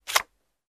Play Cards Deck Deal

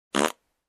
Human Fart

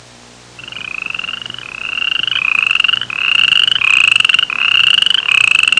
Various toad noises

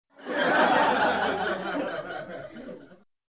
Crowd Laughs

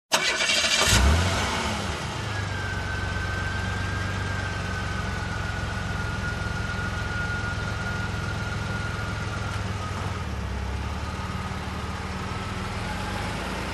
Car engine startup and drive away slowly

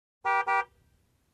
Car horn twice short

